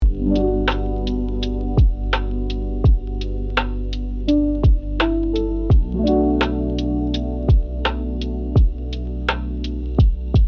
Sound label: Chill Lo Fi cozy soft focus